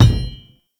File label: Debris Metal Stone; Ambience; Gameplay; Warframe; Entrati